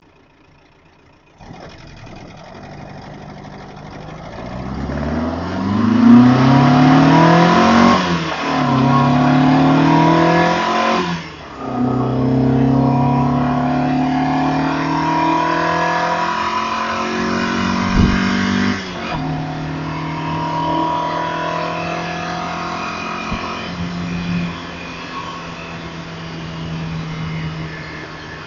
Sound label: Takeoff